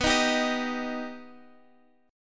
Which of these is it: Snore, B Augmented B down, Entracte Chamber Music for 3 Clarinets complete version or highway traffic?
B Augmented B down